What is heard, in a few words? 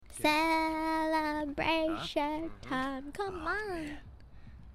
Singing; IRySinging